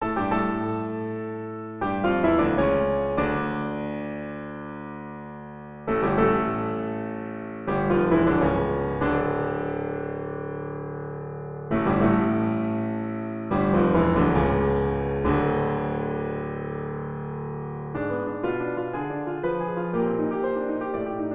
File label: piano solo